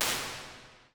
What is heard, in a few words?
Dynamic Hall; Small Spaces; Halls; Impulse Responses; Apple